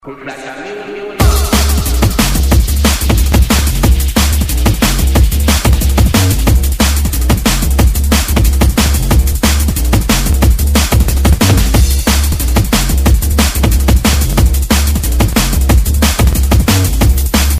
Dont go dnb

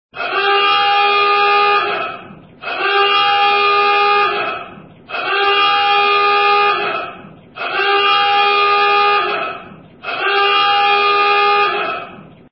Klaxon

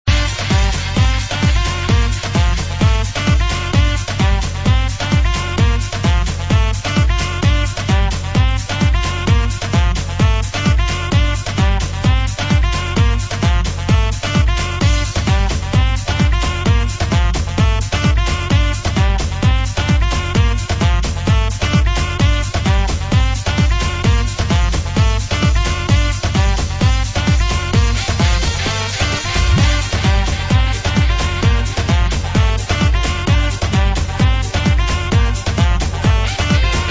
Sax

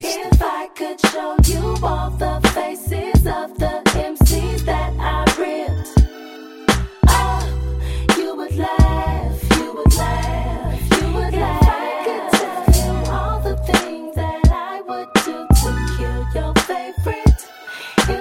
Laugh

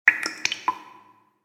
sms and mail

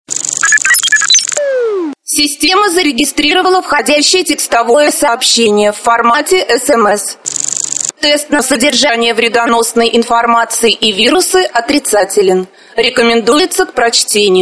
Sms

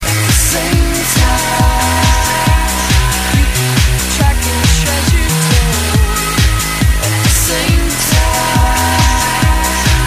trance

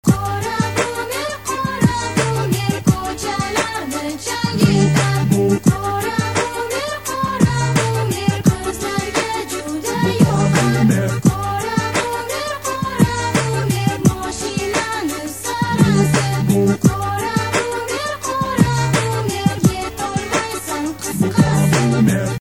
uzbek

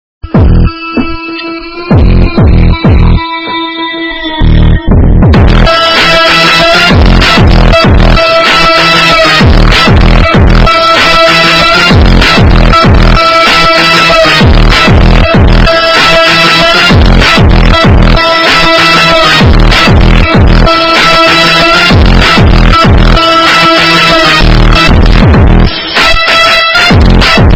super bass

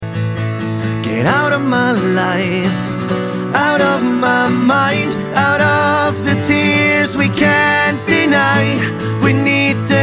Acoustic

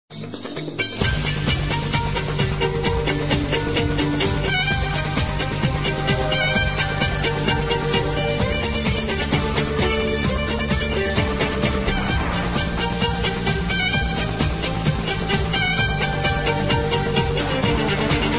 Devil s Trill; Скрипка, оркестровка

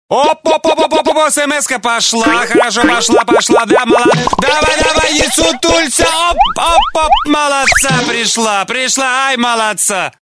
Sms

